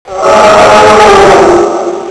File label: fall; quake2; okta